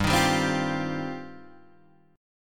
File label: G 7th Suspended 4th Sharp 5th G down Guitar Open D